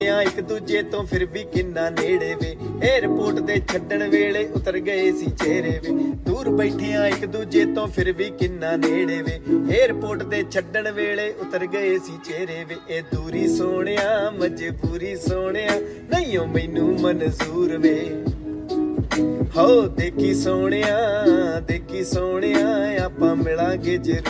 Ringtone

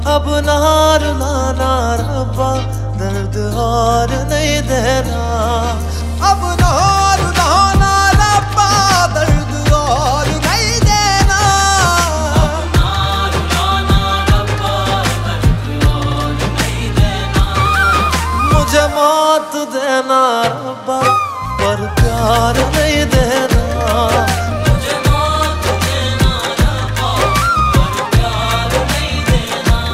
Song Ringtone

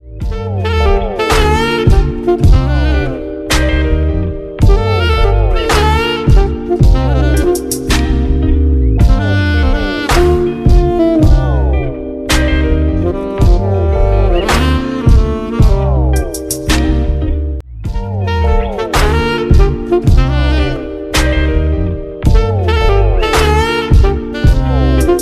Ringtone

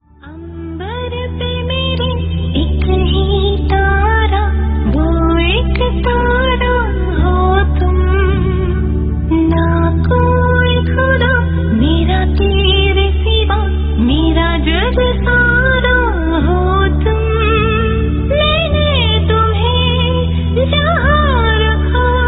Ringtone Female Version

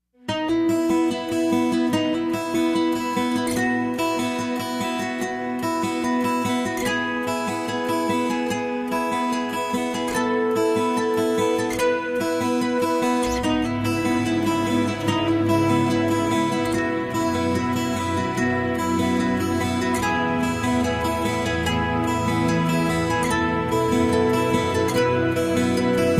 Instrumental Ringtone

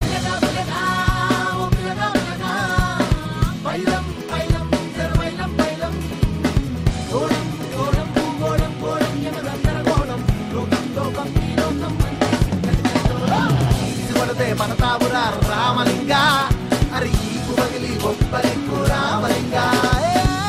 Song Ringtone

